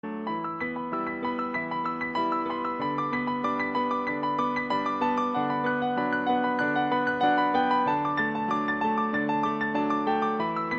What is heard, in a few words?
piano; Amelie